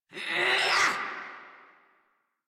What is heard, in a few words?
Attack Effort, Battle; en; Warframe; Grineer; Catbrow Handler